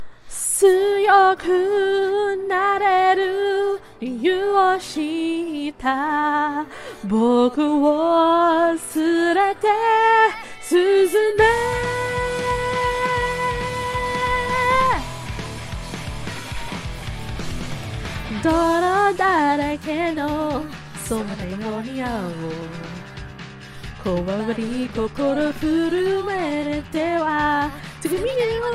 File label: singing